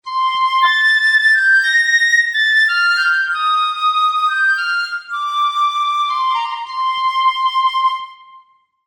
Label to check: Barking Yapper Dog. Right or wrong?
Wrong